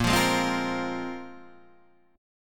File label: A sharp 7th Suspended 2nd down